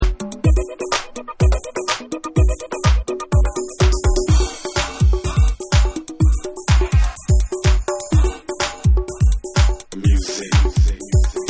DRUMS

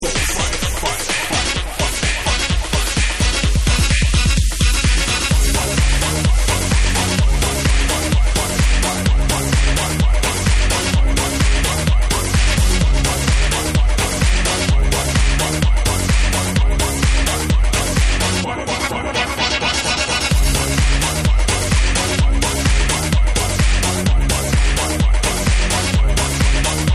Electric Guitar Mix

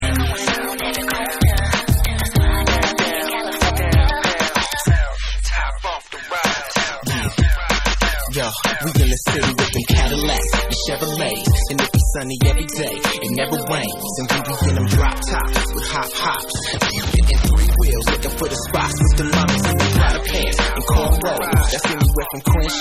Hip Hop Remix

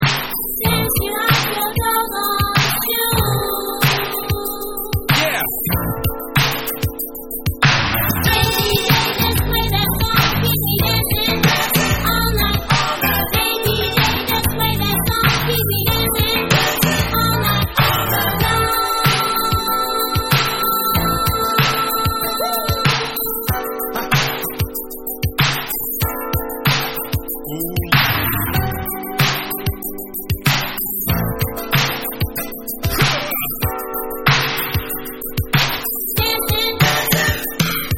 Extended Instrumental Version

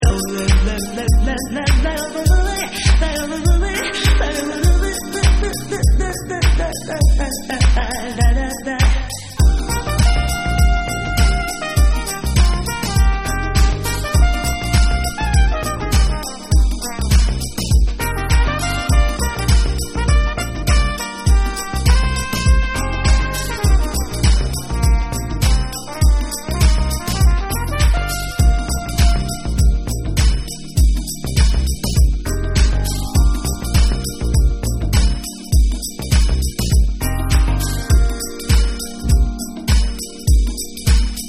Jazz Instrumental

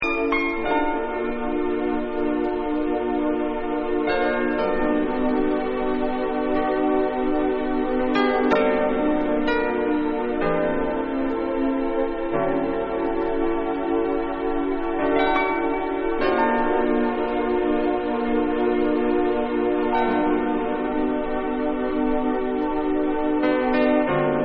Morning Strings

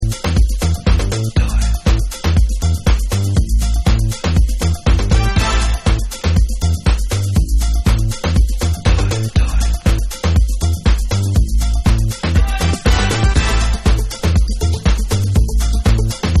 Dub Instrumental Mix

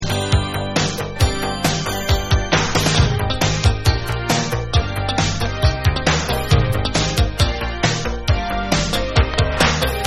Instrumental Mix

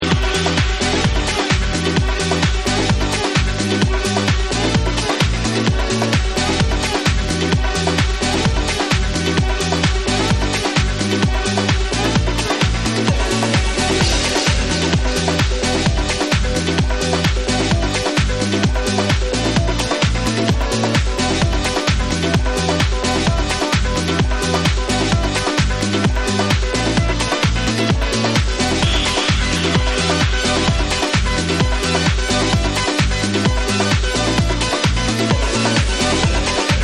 Vocal Remix

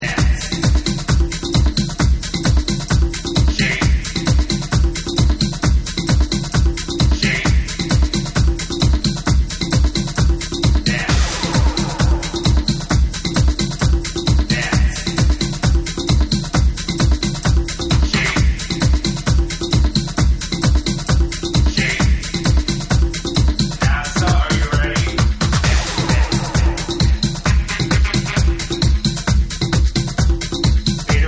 Tribal Space Mix

